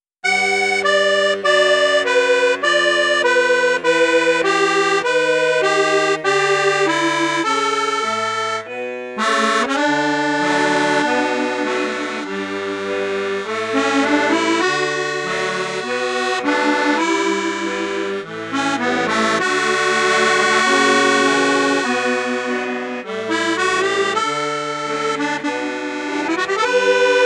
registre flute juste flute haute flute basse, accordeon; maugein amusette

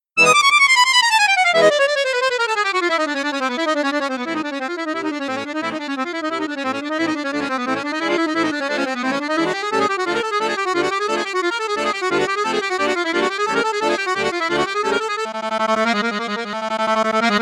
registre flute boite flute piccolo, accordeon; bugari 580 ars c

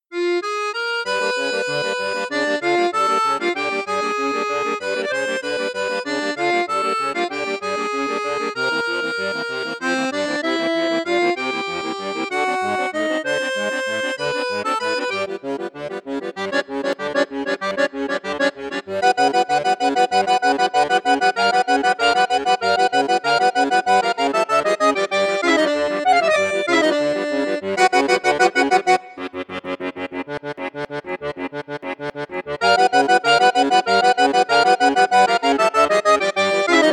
registre basson flute, accordeon; bugari 580 ars c

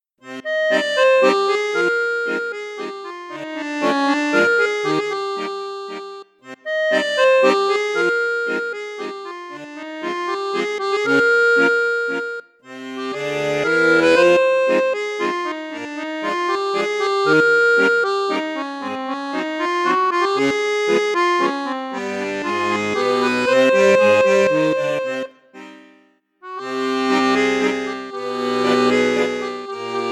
registre basson; maugein resonance iv

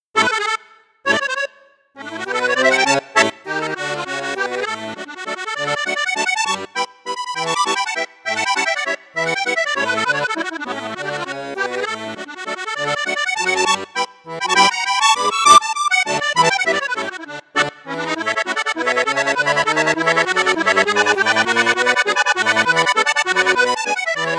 registre flute juste flute haute flute basse, accordiola accordeon

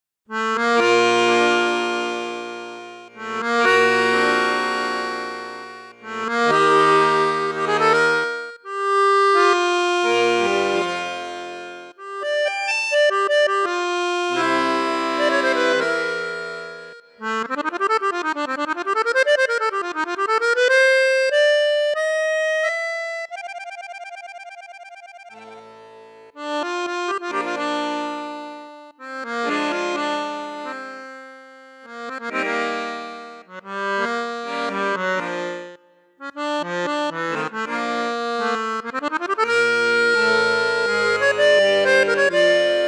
registre basson, crucianelli accordeon

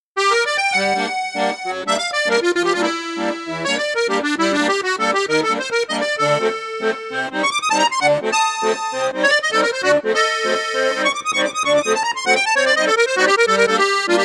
registre flute juste flute haute, accordeon; piermaria prodige plus

